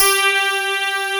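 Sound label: MIDI clavinet G4; Big Fish Audio; Synth City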